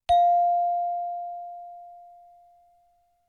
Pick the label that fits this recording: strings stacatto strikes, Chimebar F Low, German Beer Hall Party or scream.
Chimebar F Low